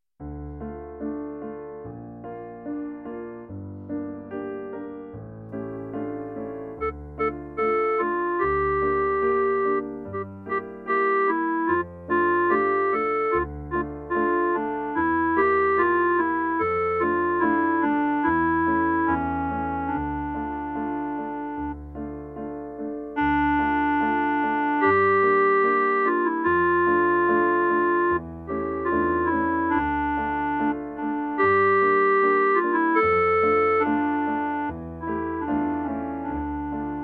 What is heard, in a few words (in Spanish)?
clarinete; musica I ESO 2015